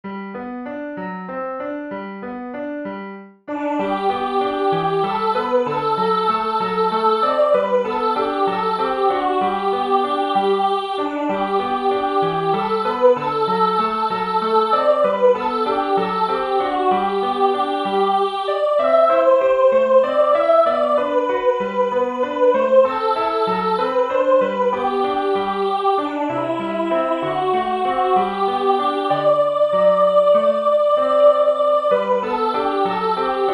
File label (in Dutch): voor zang piano; het eerste kinderliedboek